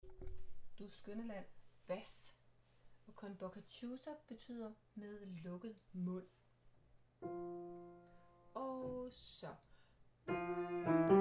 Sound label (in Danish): BAS; Du skønne land; harlev koret